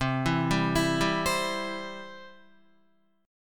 C Major, Guitar; arpeggiated; JGuitar

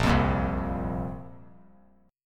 Bb Suspended 2nd Bb down; strummed

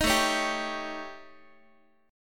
A 7th Flat 5th A, Guitar; A7b5; strummed

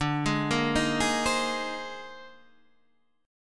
C Minor 7th Sharp 5th D; Cm7#5/D arpeggiated; JGuitar

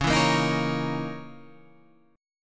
C sharp Minor 6th Add 9th C sharp

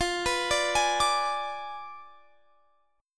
F Diminished 7th F down Guitar Standard; Fdim7 arpeggiated; JGuitar

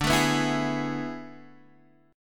A Major 7th Suspended 2nd Suspended 4th

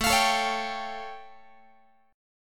A Augmented 7th A Guitar; A+7 strummed; x,17,20,19,17,18

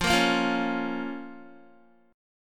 F Suspended 4th Sharp 5th F, Guitar; strummed; JGuitar

